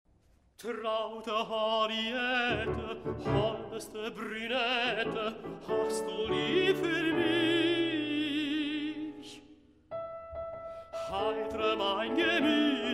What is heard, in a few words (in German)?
Lied fur singstimme mit Klavier; Traute Henriette